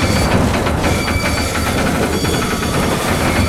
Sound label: plane scrape loop